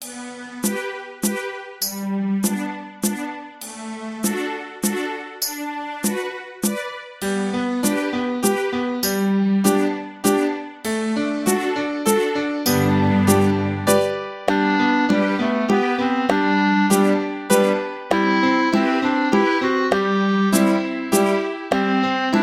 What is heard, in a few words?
Minuet in G Full